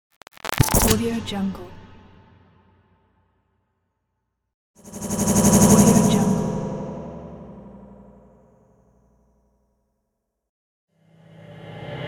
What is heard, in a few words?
glitch transition